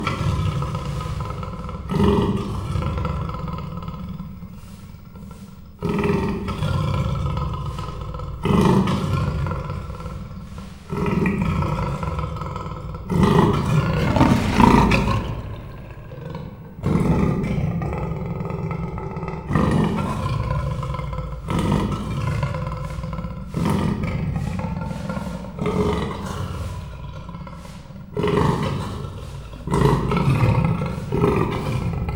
indulatosan kezdi, perzsa leopard; miskolci zoo 2018

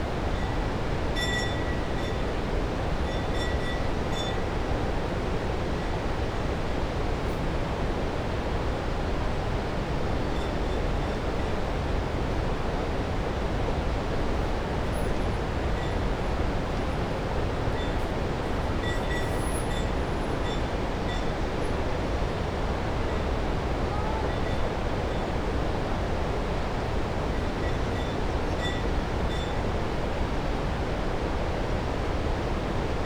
kolompoló tehén zúgó folyó harangozás

